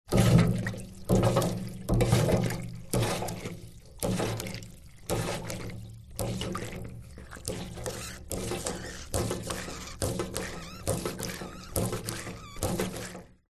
industrial pump hand pumping water small metal tank; zapsplat